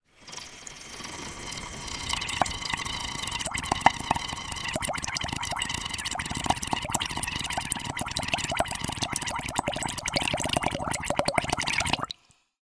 water bubbles air through drinking straw small airy bubbling